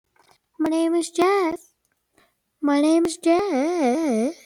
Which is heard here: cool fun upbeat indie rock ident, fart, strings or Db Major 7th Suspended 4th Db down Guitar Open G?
fart